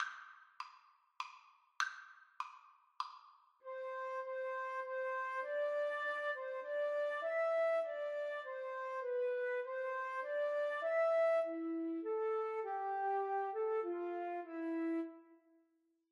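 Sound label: flute duet karaoke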